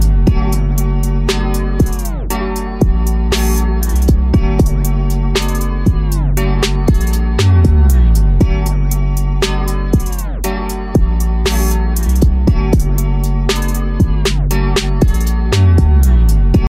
Beat, ringtones

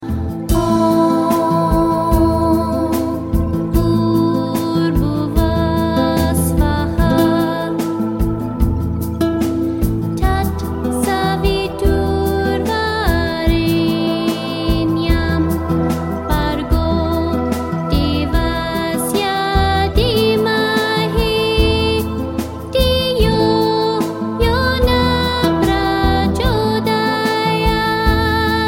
english gayatri mant

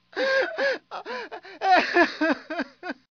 Man Child Sounds

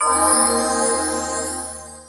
Level Up; KOTOR

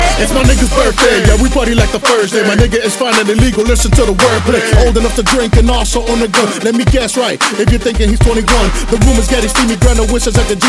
bear song

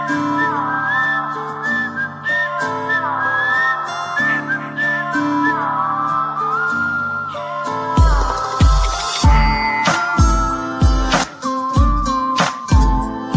horror movie girls

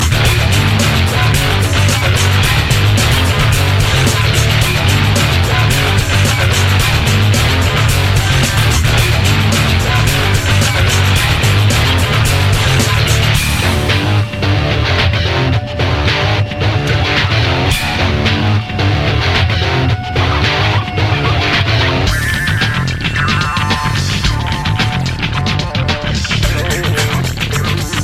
clang music; ringtones; games; nfs hp 2

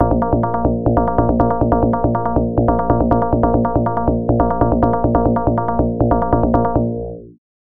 beat

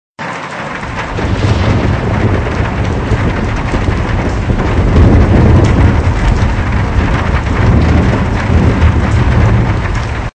thunderstorm

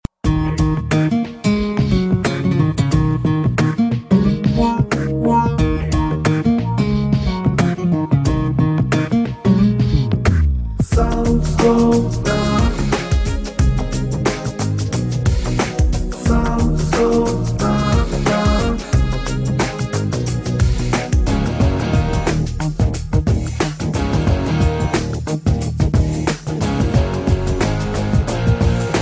south music

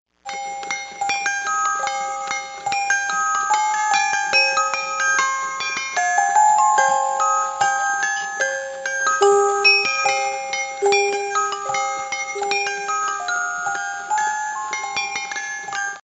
music box cool

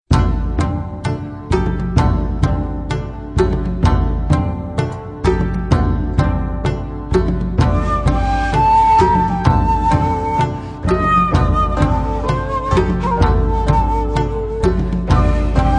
samurai flute; classical

